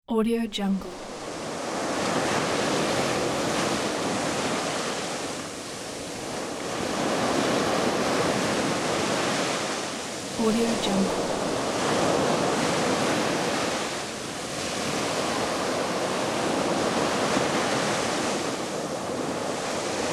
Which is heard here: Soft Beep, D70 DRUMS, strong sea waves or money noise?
strong sea waves